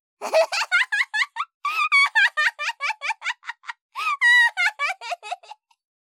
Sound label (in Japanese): 大笑い